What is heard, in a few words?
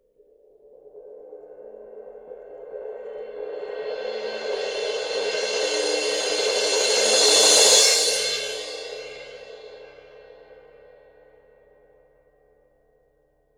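suspended cymbal roll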